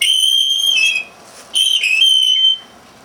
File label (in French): balancoire grince